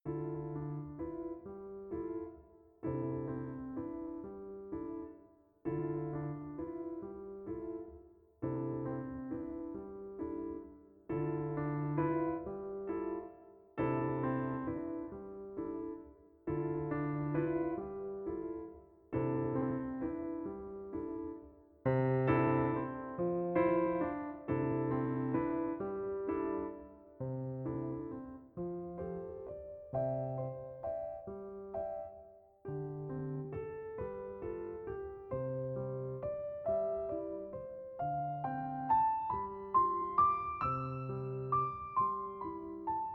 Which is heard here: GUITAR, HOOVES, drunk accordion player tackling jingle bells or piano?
piano